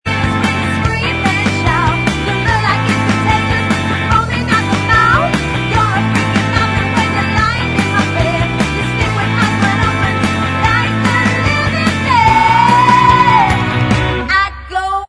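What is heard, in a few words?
backing vocals track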